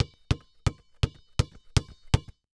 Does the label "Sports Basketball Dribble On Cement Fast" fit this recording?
Yes